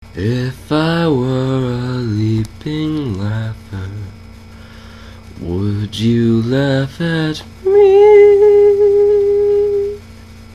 Hop Chuckle

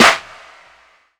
Snare Clap, drums

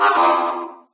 wrong gate; There; ThereClient; gamekit; racekit

